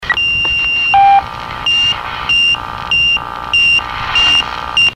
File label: plectron